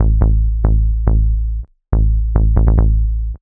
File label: ELECTRO BASS 140 A